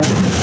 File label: stomper death big, enemies; Factorio